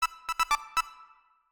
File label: comic; Samsung; Galaxy A56; Fun